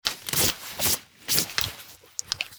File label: fabric wipes